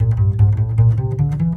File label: BASS, Drum & Bass, 150 BPM; Ueberschall; Drum & Bass Flash Trackz